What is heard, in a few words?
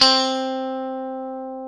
guitar, e-guitars